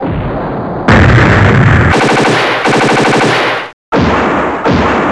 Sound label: battle